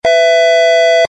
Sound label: nortel time out